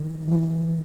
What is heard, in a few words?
Lapidarius workers, bumblebees